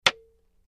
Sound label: Wood Staff Hits Light, spears & staffs